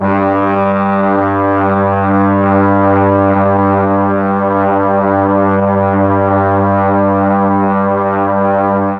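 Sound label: G2 half speed brass, mellotron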